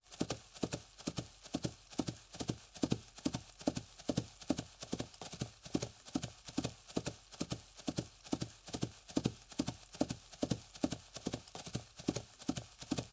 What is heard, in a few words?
Horse gallop grass; Fairlight Sound Lib